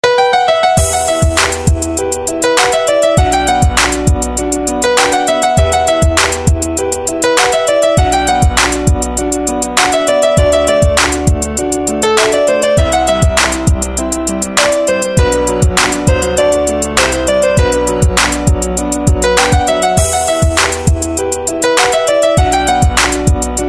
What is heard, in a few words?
Armenian